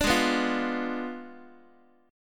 Ab Diminished 7th Ab Guitar 4A,4E,5A,5D,5G sharp,6C sharp; strummed; x,x,5,6,3,4